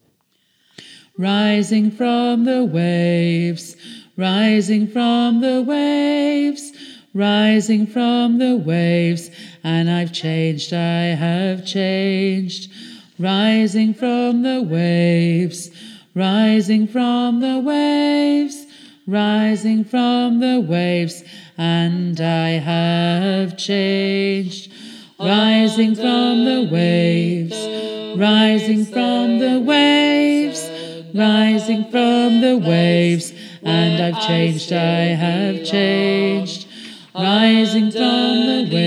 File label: tenor